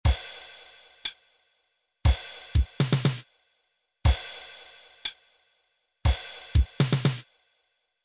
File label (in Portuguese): Toque